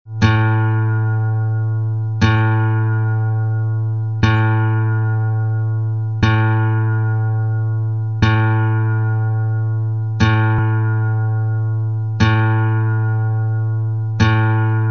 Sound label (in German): Stimmen A Stahlsaite